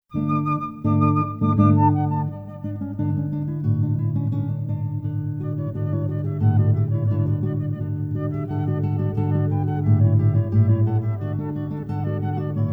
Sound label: Guitar and Flute